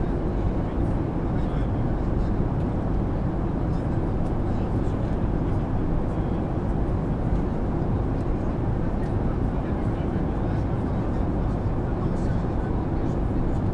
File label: Inside Aircraft binaural, Background noise